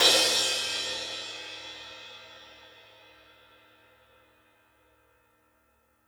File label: Crash Light, Cymbal; One Shots; Drums; Ableton Live 12 Lite; Core Library